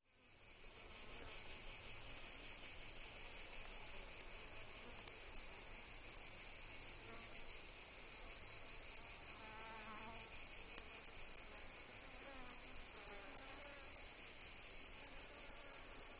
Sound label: Decticus albifrons